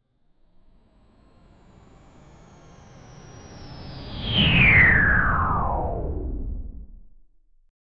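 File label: smooth left right swipe airy whoosh; motion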